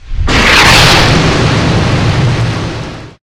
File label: jetpack boost; st mission; hs c4